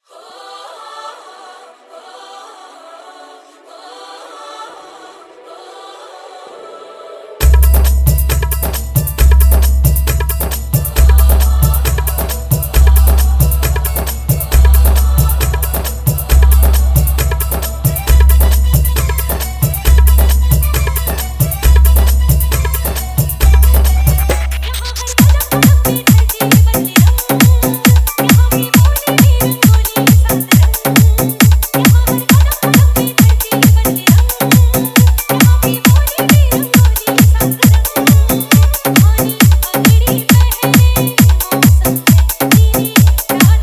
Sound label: Desh Bhakti Hard Bass Dance Mix